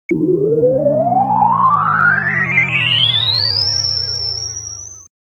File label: UFO Takes Off; Halloween Sound Effects